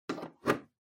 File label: TV Hardware RCA Plug In